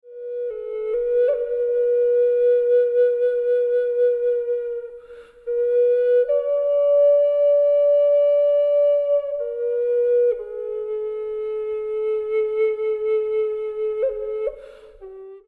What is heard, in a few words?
indian, indian flute